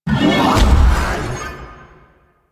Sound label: Rising Blades Cast B; Warframe; Warframes; Pax Duviricus